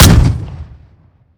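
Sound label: dpapa12 fire player, weapons; gmod; iw8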